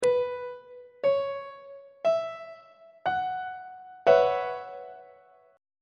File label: f sharp dominant 7th suspended 4th 1st inversion on treble clef